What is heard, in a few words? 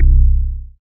BASS STER.